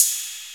808 CYMBAL; Rubber Chicken Software Sample Bank; DRUMS + PERC

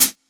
Lo-Fi Dry Hi-Hat

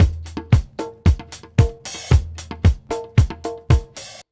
beat, Roots Disco; USB Soundscan vol.32; AKAI

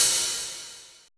GRITTY OHH, LOFI HATS

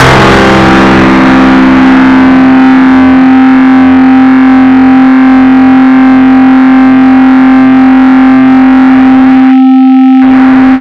disto fx, big beat; Big Beat Samples & More; AKAI; DISTOFX KIT

